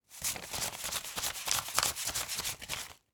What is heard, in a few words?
Counting money